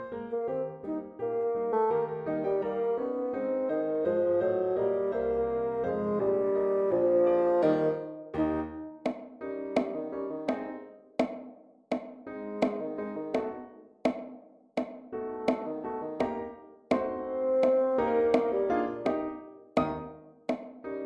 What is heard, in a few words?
Ragtime Dance; The Ragtime Dance; Transition into Stop Time Section; Woodwinds